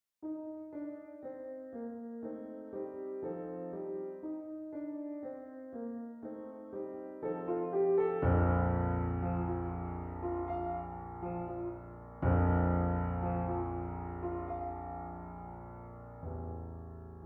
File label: 480L Music Club Mini Grand; Redline